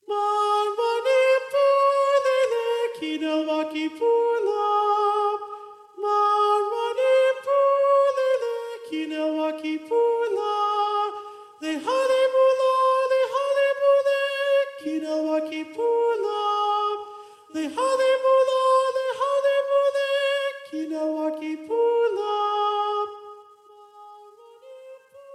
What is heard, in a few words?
soprano; mangwani